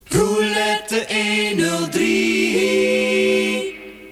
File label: silence